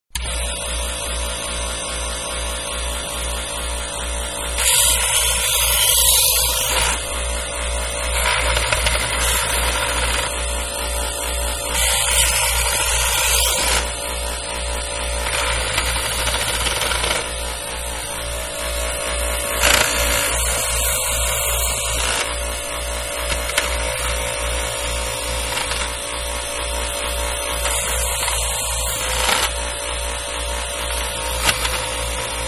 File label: Knife Sharpener; Food Prep; Restaurants; Egg Beater-Manual; Manhattan Production Music